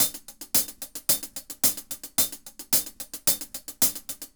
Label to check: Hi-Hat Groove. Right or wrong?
Right